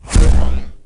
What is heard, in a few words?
Health pick; Q3A